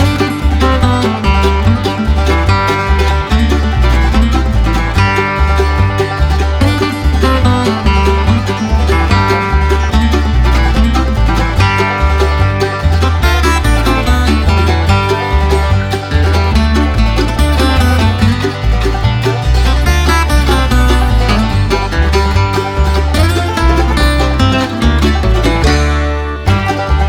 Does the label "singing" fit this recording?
No